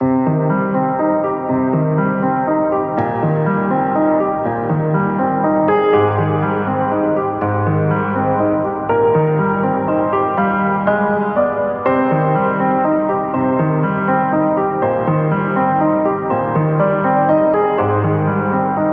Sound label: scary or sad loop